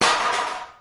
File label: hit, material metal